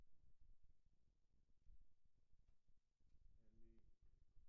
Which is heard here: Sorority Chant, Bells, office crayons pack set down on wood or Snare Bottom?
Snare Bottom